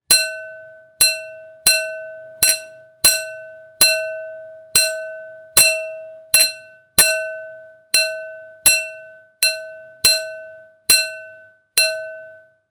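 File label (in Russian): звуки посуды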